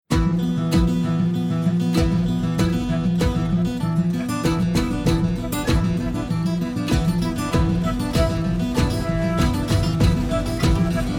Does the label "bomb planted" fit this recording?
Yes